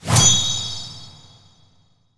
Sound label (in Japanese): シャキーン！強烈なインパクトに最適!